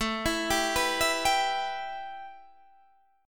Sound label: A 7th Suspended 2nd A Guitar Open D, chord